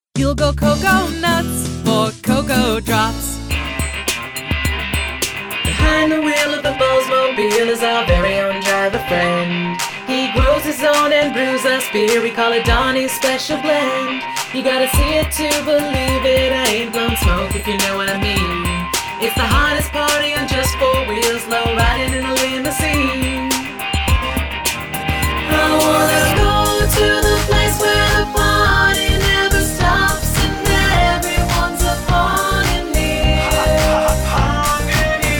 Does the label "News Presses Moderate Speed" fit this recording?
No